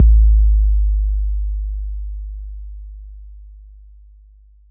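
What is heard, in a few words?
bass drum 808 Moog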